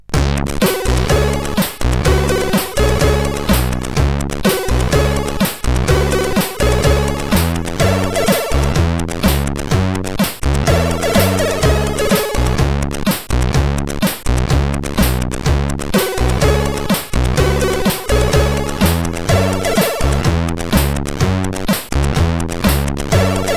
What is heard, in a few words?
sid CSG8580